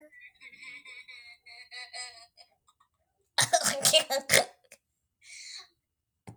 laugh snort

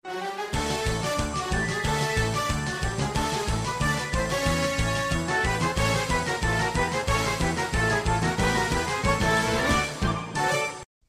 fanfare

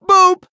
boop

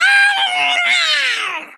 murloc knight attack

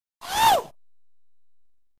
ooh; moonwalker; sega genesis

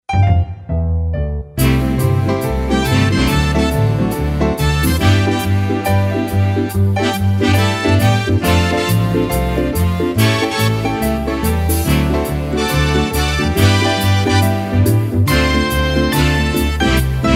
Instrumental

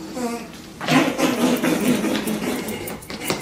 jumping fart

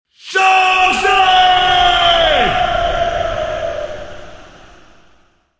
shot charger

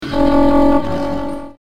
bird sound; twili

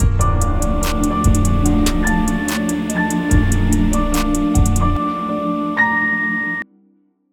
ghosthouse music beat

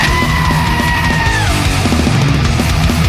scream

